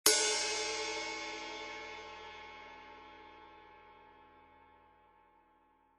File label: 20in Heavy Ride, cymbal